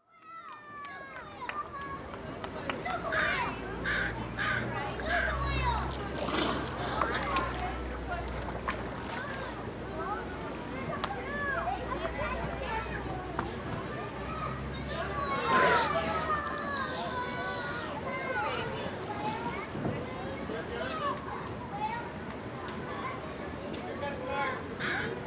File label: children zoo with whale